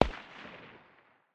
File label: ak 556 far distance, 5.45x39mm; tacz-tweak-pack; taczmechanics